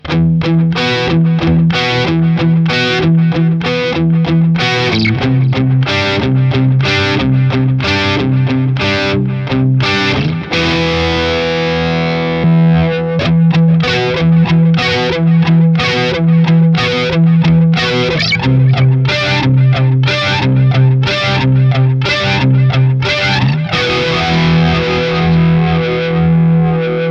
Brown Delay Reverb Flanger an; Boss Katana Artist

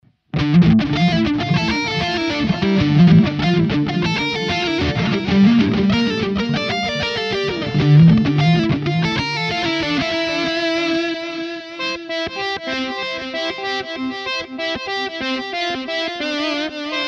Eventide Ultratap Delay

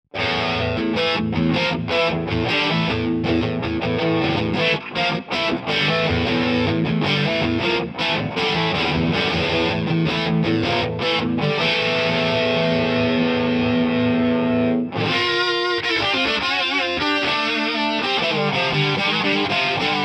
Archetype Toe 5th Heel 4th Whammy

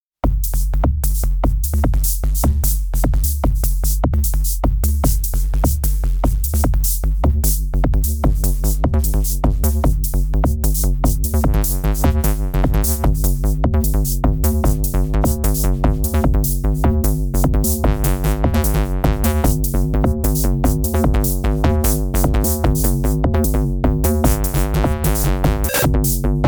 Trinity Neon Mode; Modbap Modular

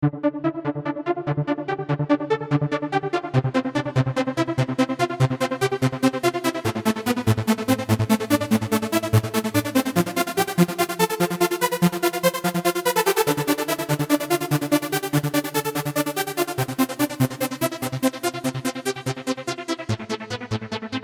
Lead

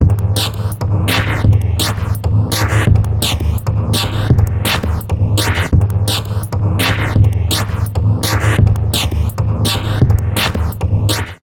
Orange Vocoder Drums Freeze FX; Zynaptiq

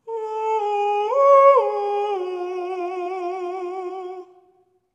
Female Vocal

